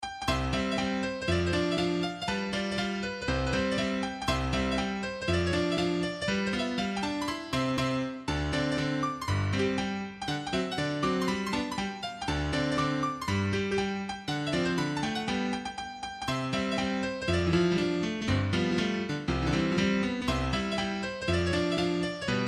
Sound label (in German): Schottisch